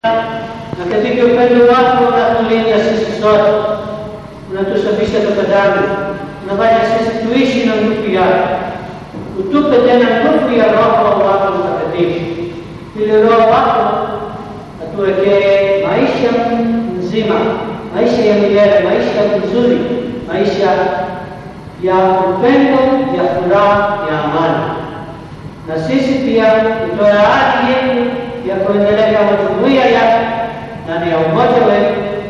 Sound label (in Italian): benedizione, Canti chiesa; Canti dalla Chiesa della Missione Magnatta Jillo; CANTI dalle MISSIONI CATTOLICHE